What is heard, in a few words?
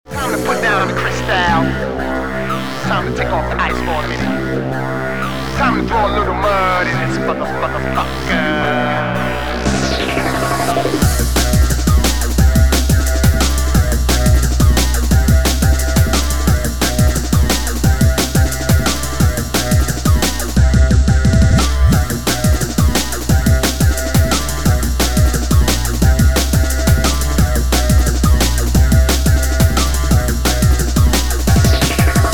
drum